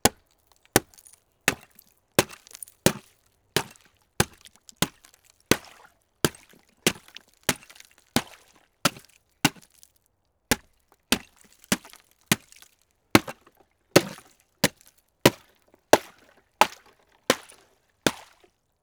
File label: breaking ice; nature